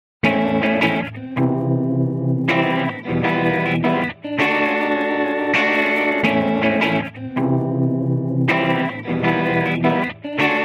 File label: Stereo Flanger Detuned Flanger Guitar Wet; Sonicircuit; Syntheway